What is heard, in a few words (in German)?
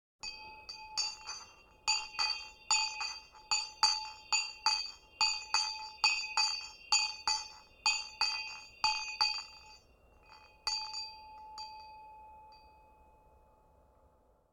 schulglocke langsam; Auf dem Land